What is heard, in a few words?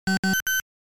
Snow-Bee Egg Destroyed; Pengo; arcade